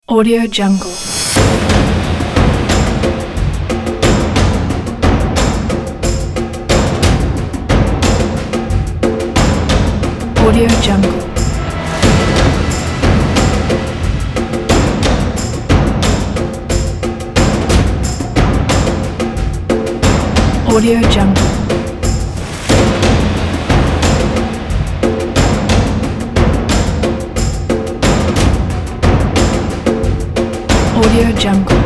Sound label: dynamic drums